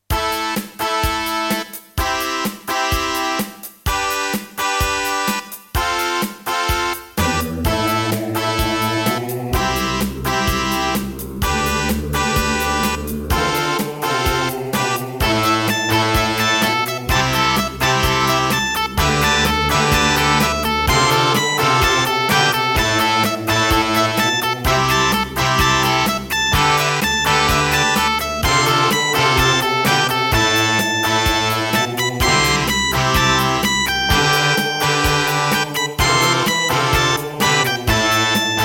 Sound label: Raptor - Wave 1, soundfont; EmperorGrieferus 131MB Soundfont; raptor call of the shadows